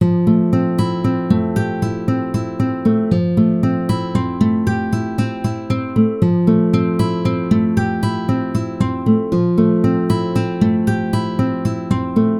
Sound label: Real Guitar; Hey Pikmin